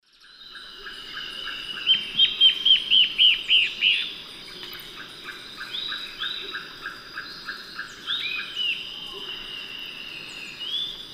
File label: Hypocnemis peruvianus, song, calls, duet; S. America; Eastern Lowlands